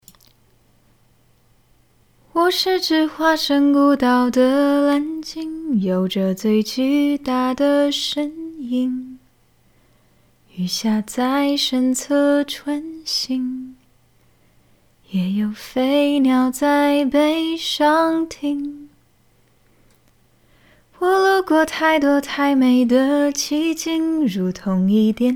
试音 歌曲

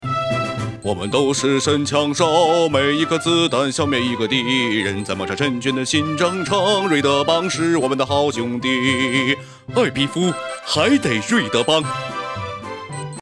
唱歌 广告

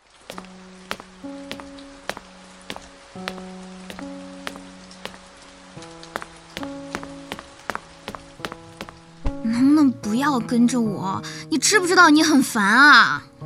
音效音乐

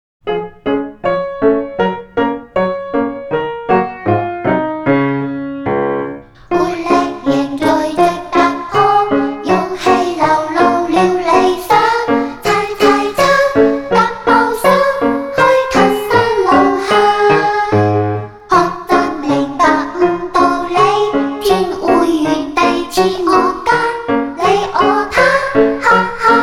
校歌; 天汇园地校歌

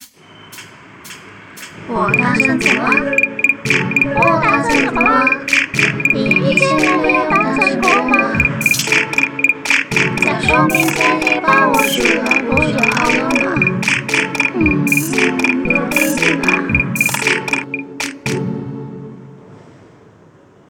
尿床歌

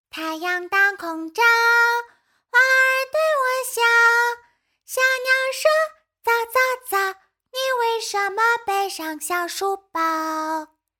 女童上学歌

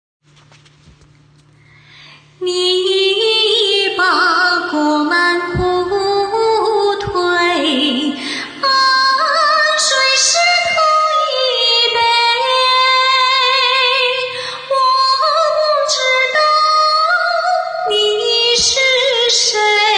清唱

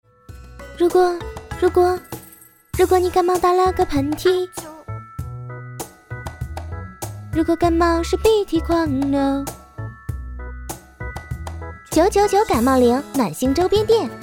唱歌广告; 感冒灵

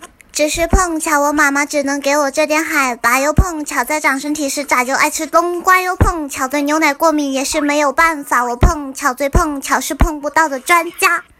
矮子歌

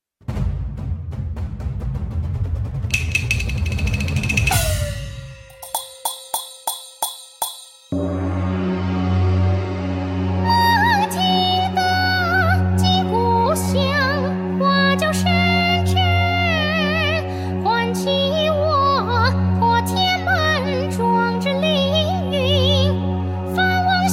戏腔

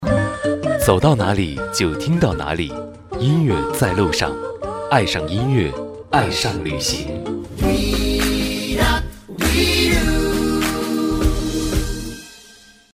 音乐 片头; 在路上片头素材

